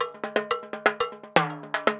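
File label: TIMBALETA, salsa drums and percussion; Best Service ProSamples vol.14; AKAI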